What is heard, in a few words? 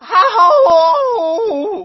anguish sound; luigi